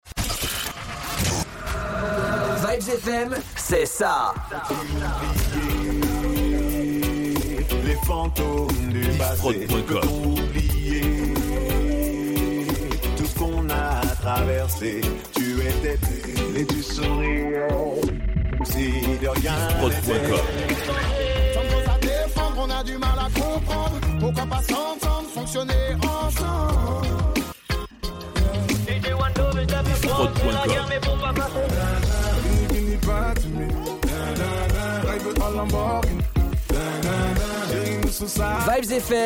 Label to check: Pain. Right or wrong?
Wrong